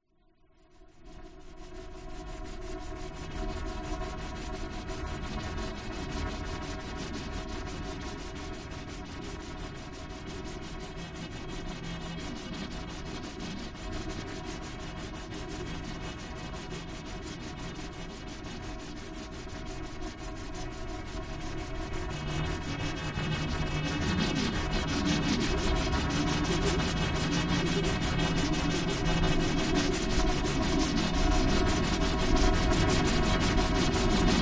sound design digital swarming insect modulation filter; scott lawlor